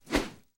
Small Swishes Light

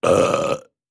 Med Belch; belching

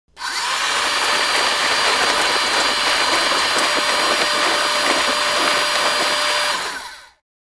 Blender Turn On Run Hi

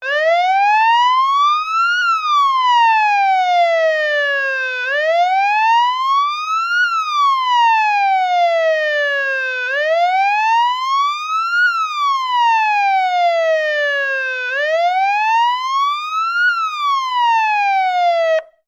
Police and fire engine sirens scream.

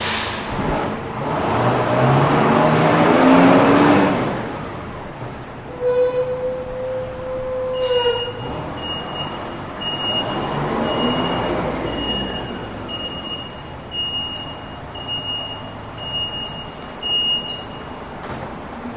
Garbage Truck Air Rel

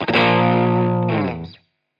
Electric Guitar Soft

